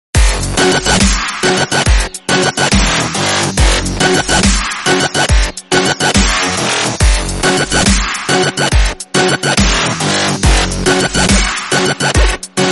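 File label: redneck dubstep music; iPhone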